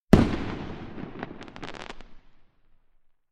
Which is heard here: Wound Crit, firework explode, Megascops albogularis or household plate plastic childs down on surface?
firework explode